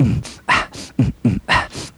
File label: nay, beatbox; Drum loops